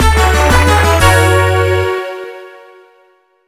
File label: SUCCESS; Mots Croises